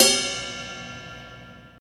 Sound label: ride sound e key, Royality free ride sound tuned to the E note. Loudest frequency: 5030Hz